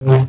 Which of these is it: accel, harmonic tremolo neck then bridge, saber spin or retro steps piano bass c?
saber spin